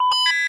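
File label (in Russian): писклявый пищащий короткий сигнал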